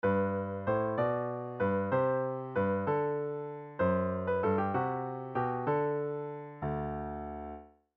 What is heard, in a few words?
step 3 major